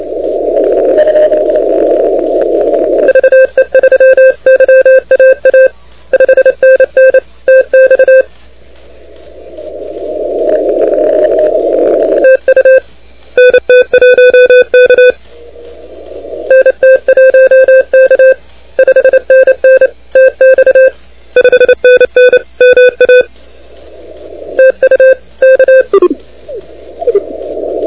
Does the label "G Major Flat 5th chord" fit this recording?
No